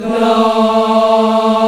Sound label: VOX, African Aahs, African Las